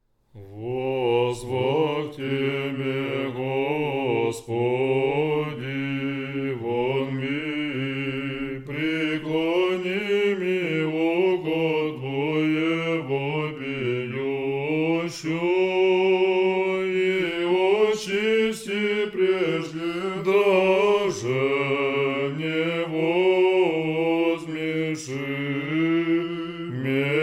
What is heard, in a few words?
second antiphon tone 8